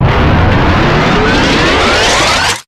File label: uprise, Zaps and Beeps